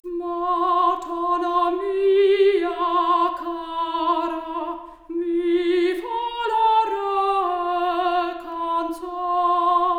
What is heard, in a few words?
Soprano Stereo output; 3bit